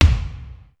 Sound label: solid hl, sr16 bd